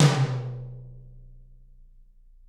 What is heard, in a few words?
TT, Toms; Bob Clearmountain Drums I